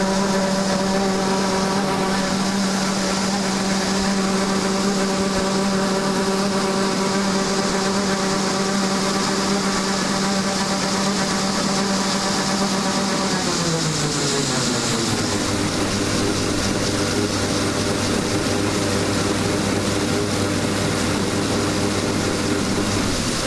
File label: Outside Combine Unloading